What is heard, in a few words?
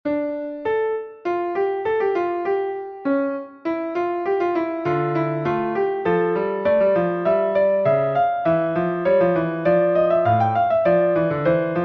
invention d minor